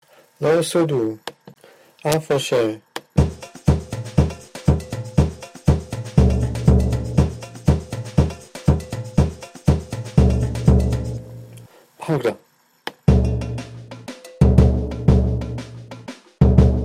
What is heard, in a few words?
Low Surdo